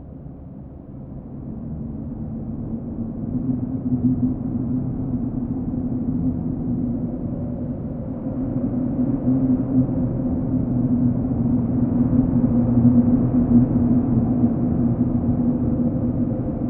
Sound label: Cave Wind Amb Loop